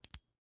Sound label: gallery choice; Samsung; Galaxy S8; SecGallery2015